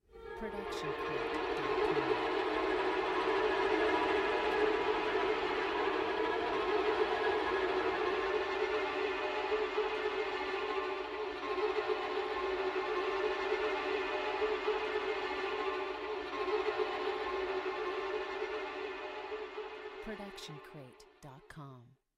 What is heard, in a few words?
Long Cluster Trem; SOUNDSCRATE